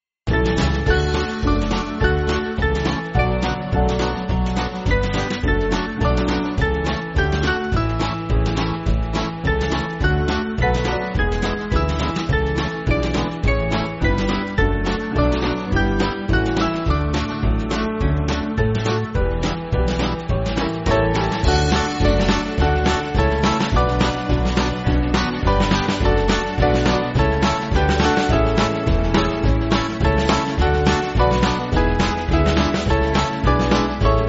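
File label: Band, Backing Files for Churches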